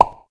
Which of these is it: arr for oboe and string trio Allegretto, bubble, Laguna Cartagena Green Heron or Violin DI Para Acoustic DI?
bubble